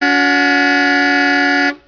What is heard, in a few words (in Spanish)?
claxon; efectos